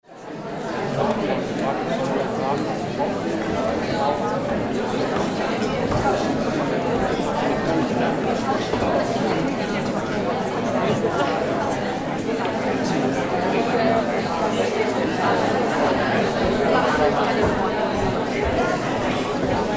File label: hall small atmo